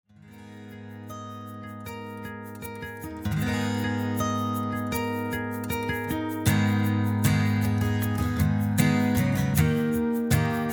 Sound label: perc